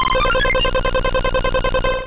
Aeroblast; sfx; attack moves; pokemon; gen 2; gsc

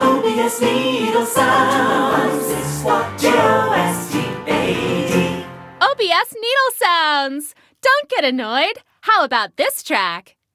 Radio Jingle; let it die; OBS

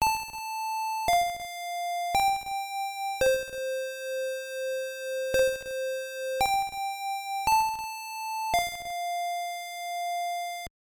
School Bell; Famicom Tantei Club Part II Ushiro ni Tatsu Shoujo; Famicom Disk System

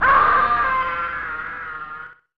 Kevin Screams; Home Alone; SNES

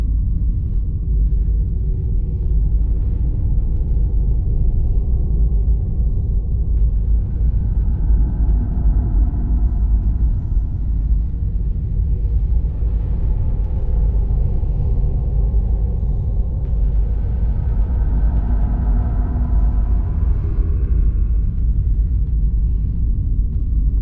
AMBIENCE

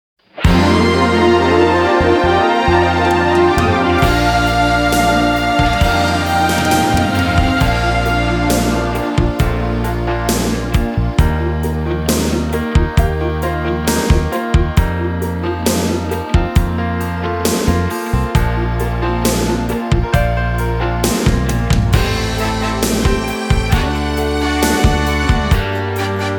ending version karaoke; Tristia of the Deep Blue Sea

